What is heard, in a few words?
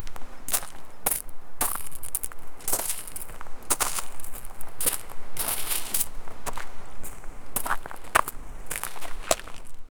walking on thick stone